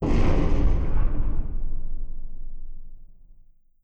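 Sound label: Collision Interior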